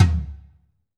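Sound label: TOM, Drum Machines; EdgeSounds; Drum Mashines VOL-1; X-DRUMS KIT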